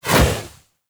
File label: Edge Fist Swipe; Warframe; Pacifist